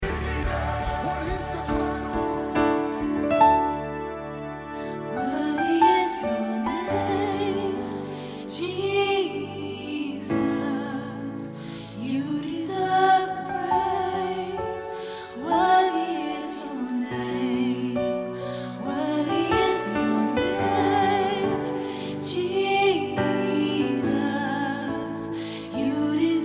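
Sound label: alto; Malieveld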